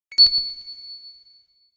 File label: Alert Shine